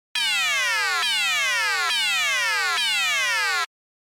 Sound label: error; エラー1